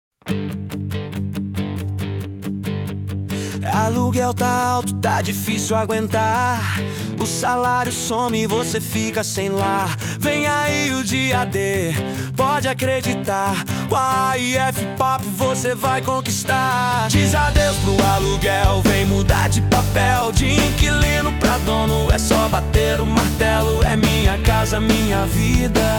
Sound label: Pop Rock